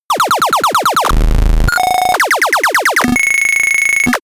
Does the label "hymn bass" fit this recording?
No